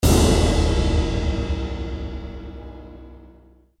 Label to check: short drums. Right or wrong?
Right